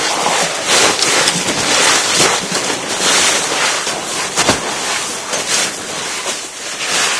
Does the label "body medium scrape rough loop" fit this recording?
Yes